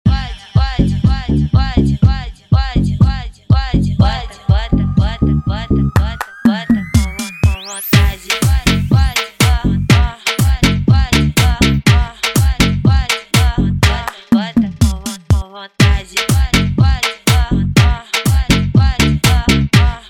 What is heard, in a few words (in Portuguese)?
mega funk automotivo